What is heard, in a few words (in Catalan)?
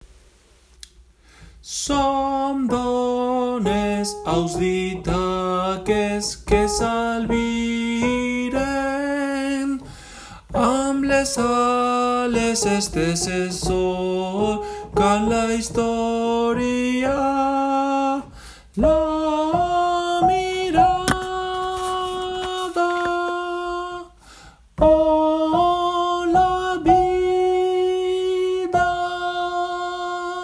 Cançó de mar TENORES